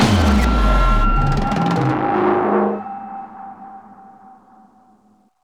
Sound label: Transition